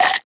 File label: bite apple